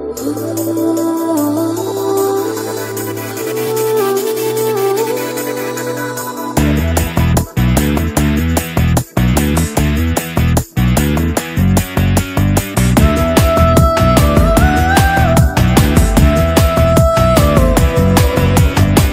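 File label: background Tone Telugu Ringtone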